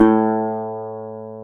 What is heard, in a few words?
GTR, Dobros, National